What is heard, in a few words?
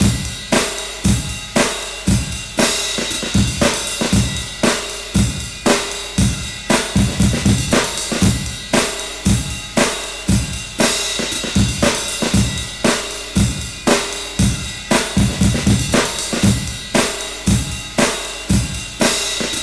34 ONE DAMNED BREAK 117 bpm, Loops; POTBreaks